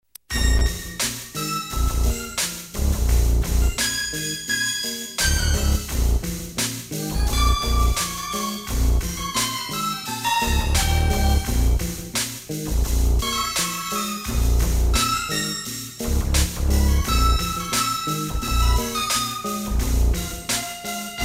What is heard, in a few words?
Drama suspense